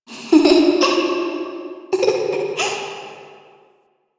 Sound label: Dungeon Island Recorded Children Misc Ambience; Warframe; Duviri